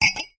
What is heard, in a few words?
household glass champagne flute set down on hard surface; zapsplat